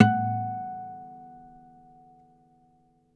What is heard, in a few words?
Harm F#4, Classical Guitar